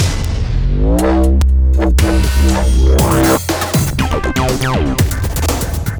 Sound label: Taunt; Evos